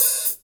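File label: HH, hi-hat; Sound & Vision - Gigapack I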